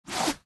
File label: Ninja Swipe; Fancy Pants World 1 Remix